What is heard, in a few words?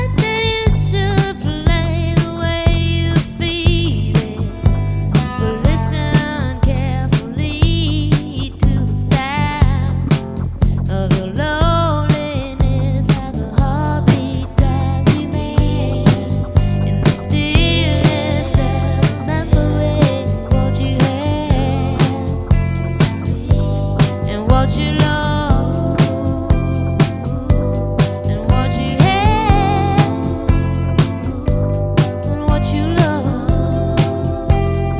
heartbeat